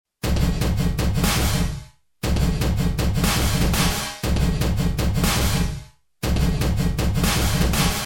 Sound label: Rhythmic Synched Drum Reverse Reverb